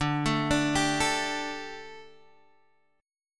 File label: D Suspended 4th, Guitar, Half Step Down